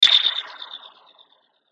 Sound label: Hit Burst Flange Vibrato; Production Element Imaging Element Accent Transition